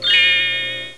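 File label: glissando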